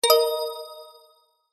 multimedia game sound mobile game warm positive twinkle attention alert